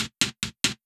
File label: Hi Hat Rhythm; Trip-Hop